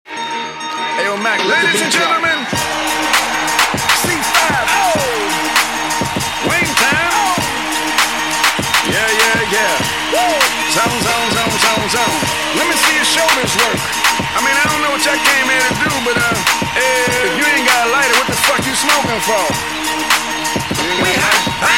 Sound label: Uproar